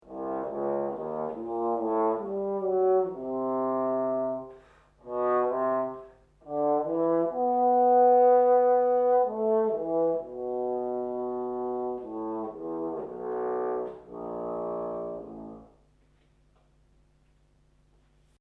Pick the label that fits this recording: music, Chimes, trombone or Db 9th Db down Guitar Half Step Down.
trombone